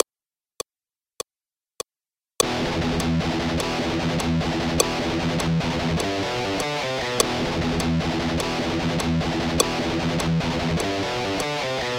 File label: Behind Beat, Rhythm Guitar Playing